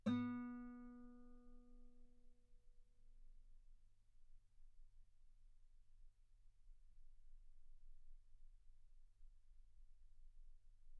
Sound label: Guitar ff B3; sul B